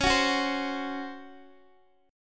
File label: Db Major 7th Suspended 4th Db down Guitar DADGAD